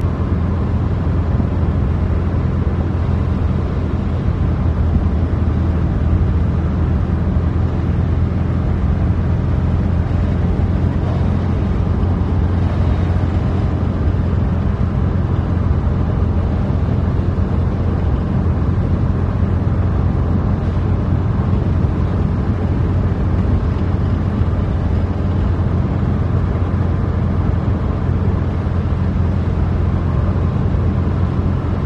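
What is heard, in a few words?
police car recording from inside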